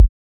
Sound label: Kick; Samplepack Produtique